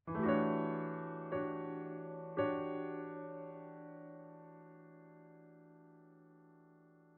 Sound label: Factory Boss Piano; music